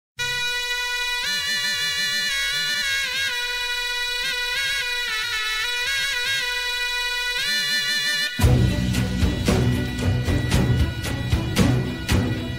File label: Soz Dizi; Vatan